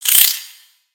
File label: Spider Attack Ranged; Lego; creatures mechanical